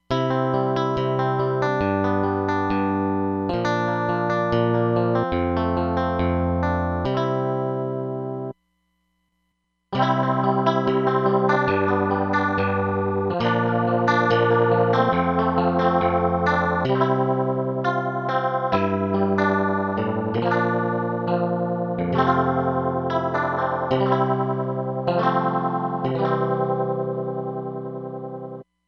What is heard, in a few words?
Tremolo Leslie, Roland DEP-5; Factory Patches